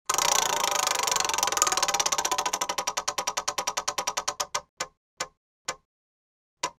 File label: spin wheel